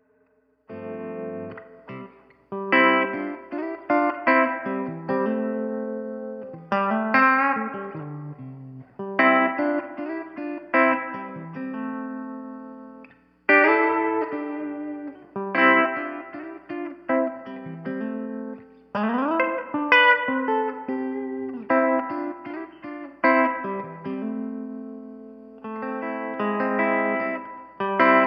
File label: modverb; ventris